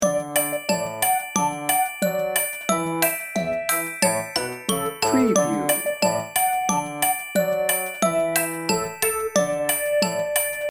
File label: wheels on the bus loop